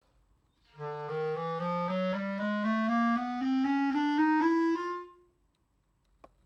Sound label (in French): Clarinette chalumeau